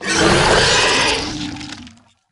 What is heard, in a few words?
Death